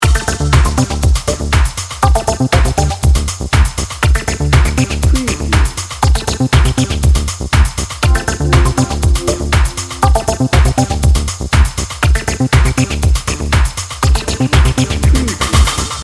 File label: upbeat innovation loop